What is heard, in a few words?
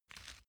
small pepper grinder